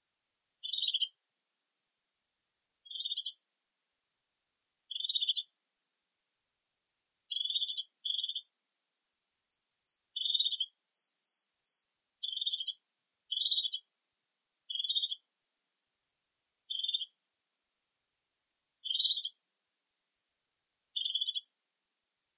Haubenmeise

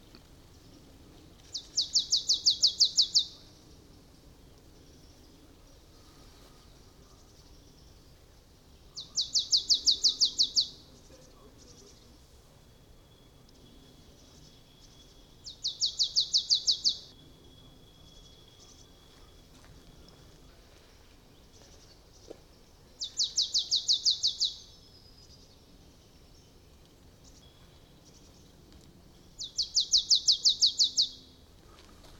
sumpfmeise

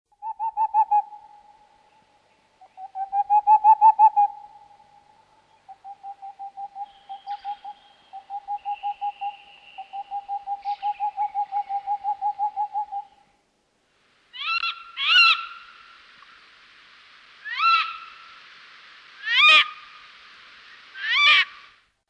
raufusskauz; rauhfusskauz

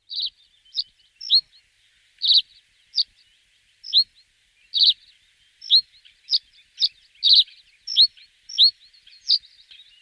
rohrammer

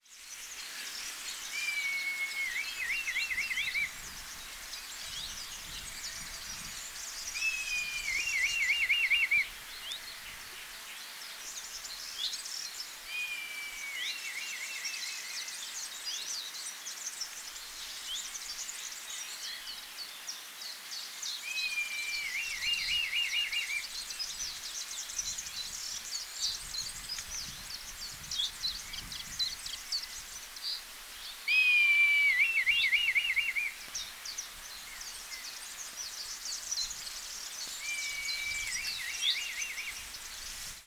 Rotmilan

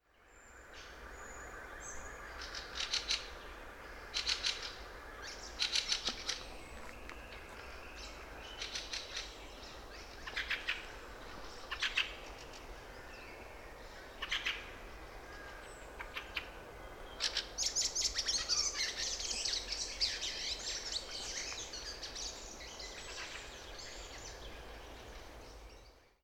wacholderdrossel

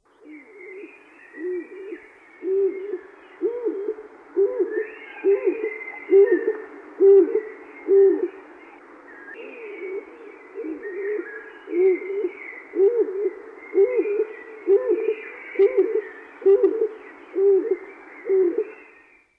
hohltaube

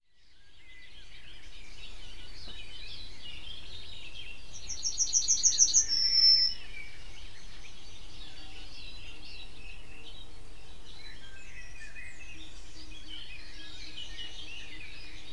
Goldammer; Stefan Wehr

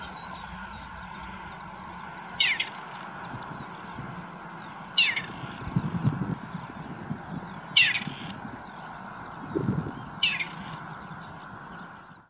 rebhuhn; Stefan Wehr

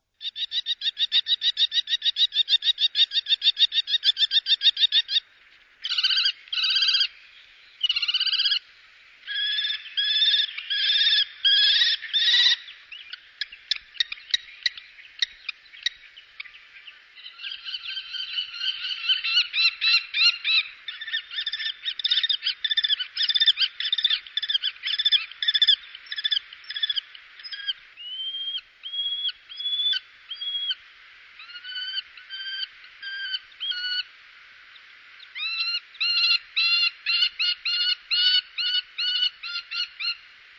turmfalke

